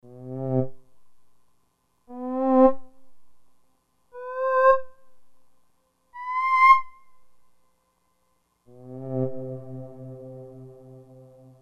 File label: Upper Tone, Roland D-50; 37 Soundtrack